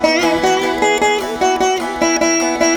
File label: veena; Spectrasonics; Heart of Asia; INSTANT ASIA